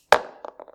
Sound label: Stone floor; Blind Survival